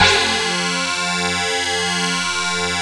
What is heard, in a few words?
YAMAHA FM; OTHER SYNTHS; Rubber Chicken Software Sample Bank